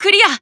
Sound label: Emo Glad